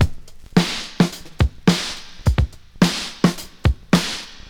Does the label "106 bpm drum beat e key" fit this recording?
Yes